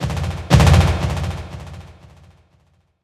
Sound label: ambient port; World Of Warships; port3d